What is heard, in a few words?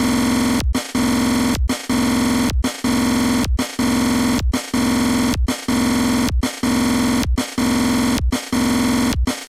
Drum Loop; lolicore